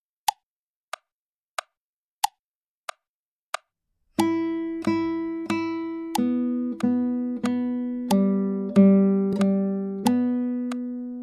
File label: Waltz